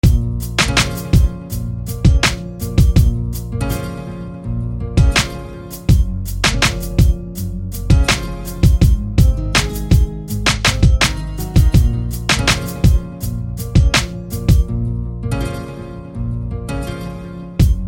Guitar Harp; Shadowed Guitar Harp; SFB FX

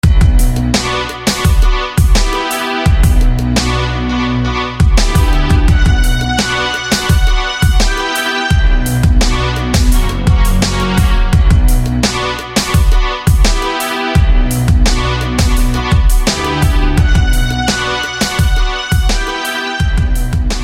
Strings; Shatterscar; SFB FX

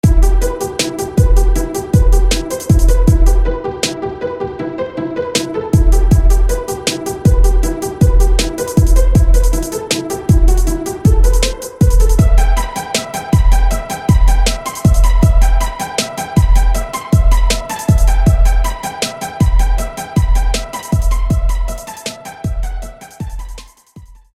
Pizzicato; SFB FX

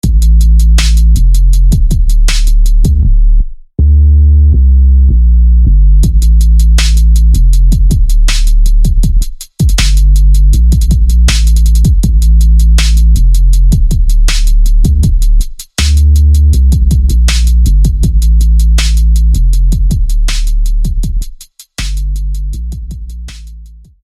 Punch bass; SFB FX

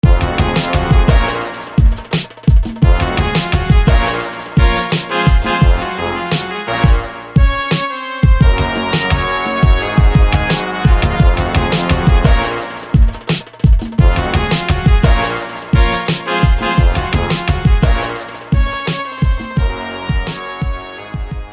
Double Brass; SFB FX

